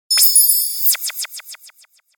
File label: Chime Shimmer Warp Delay; Production Element Imaging Element Accent Transition; 5.1 STEREO MIX; Blastwave